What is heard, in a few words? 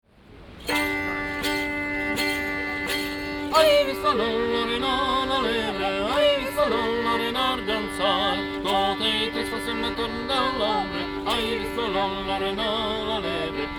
Medieval Duo, field; Prag